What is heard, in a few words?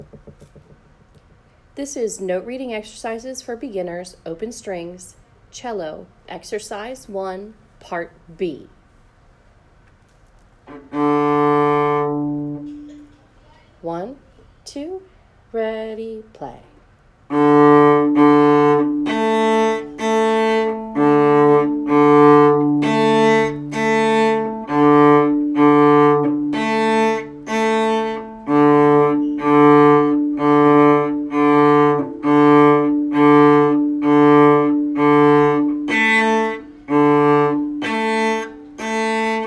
note reading exercises for beginners open strings cello